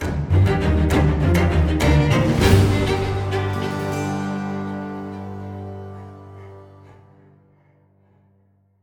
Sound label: String; Assassin's Creed Unity